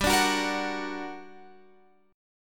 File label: G Minor Major 7th, down, Guitar, Open F; GmM7 strummed; JGuitar